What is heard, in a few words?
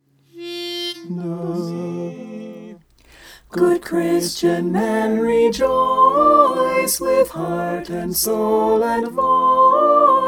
soprano